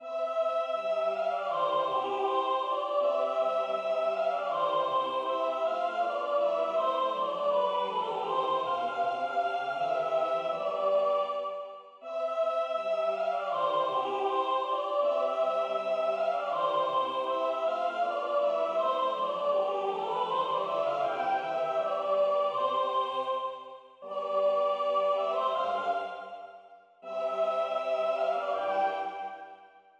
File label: Agnus Dei Sopran